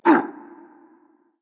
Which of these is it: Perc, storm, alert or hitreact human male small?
hitreact human male small